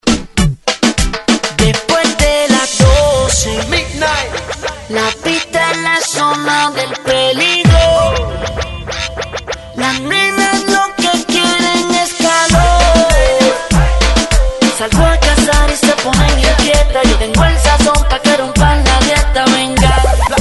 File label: Hype Extended 99 Bpm; DJDOLLS